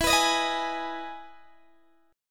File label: E Major 7th E down Guitar DADGAD